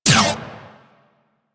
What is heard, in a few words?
Cart Turret Laser Shot; Warframe; Venus; Venus Turrets